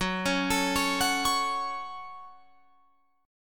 Gb Minor Gb Guitar Open F; arpeggiated

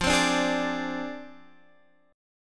F Minor 6th, Guitar, Standard; Fm6 strummed; 13,15,12,13,15,x; JGuitar

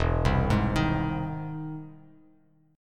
E Augmented Major 7th E down Bass Standard; E+M7 arpeggiated; JGuitar

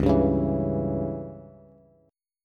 Db Diminished Db down Bass; strummed; JGuitar

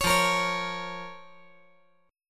F Suspended 4th Sharp 5th F

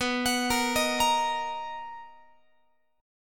B Major 7th B down Guitar; arpeggiated

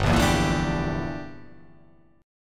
G Minor 6th Add 9th G down

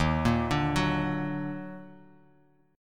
D sharp Minor Major 7th D sharp down; arpeggiated; chord

